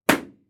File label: balloon pop